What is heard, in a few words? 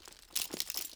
Commando Belt Rattle; Foley; Commando walking elements